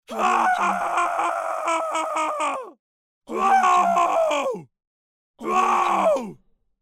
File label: scream